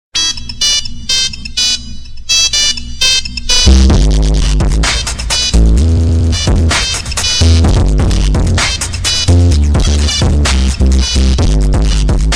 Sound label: Woofer Test